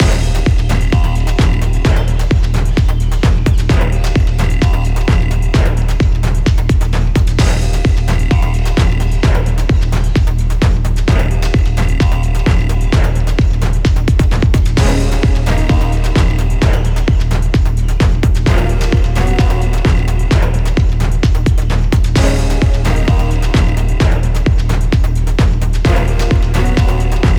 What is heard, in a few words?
Beat On The Drums